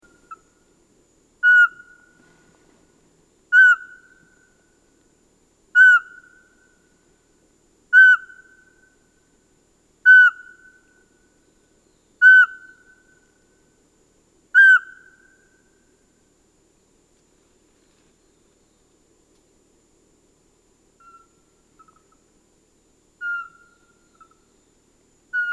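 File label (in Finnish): varpuspöllö, lintuäänet; Soidinääni yksitoikkoinen harva vihellyssarja; Antero Lindholm; xeno-canto; Kuusivaltaisissa metsissä Etelä- ja Keski-Suomessa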